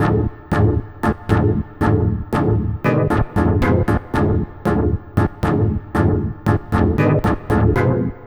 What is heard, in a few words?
Lead